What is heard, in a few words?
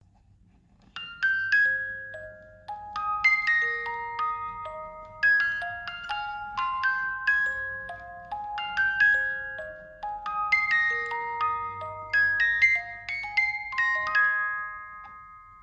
18 lames, boite a musique; Boîte à musique en bois personnalisable